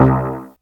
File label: lead; Mono